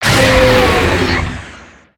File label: howl; creatures organic